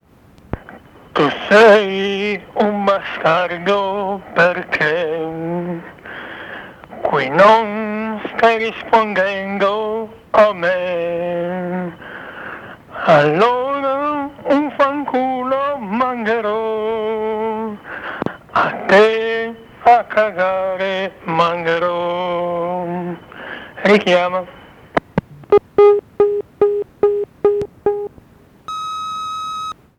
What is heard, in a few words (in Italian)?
canto di natale